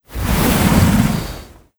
boss, monster; Fireamy; lava

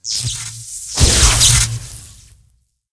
machine attack, monster

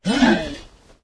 orc damage, monster

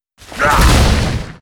scholar atk; monster

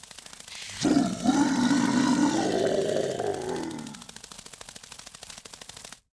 mutroop main, monster

